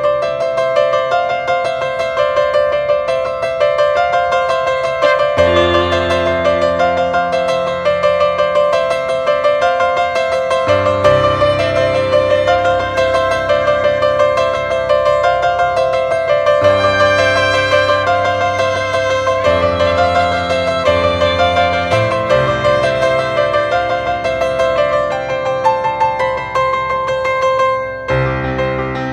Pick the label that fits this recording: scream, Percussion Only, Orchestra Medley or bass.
Orchestra Medley